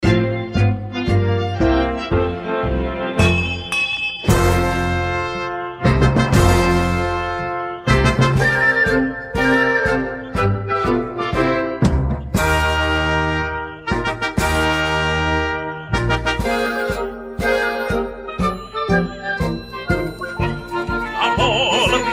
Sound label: Accompaniment Track, F Soprano Tenor; Vocal Audition